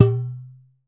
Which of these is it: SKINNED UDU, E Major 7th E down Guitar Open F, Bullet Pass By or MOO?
SKINNED UDU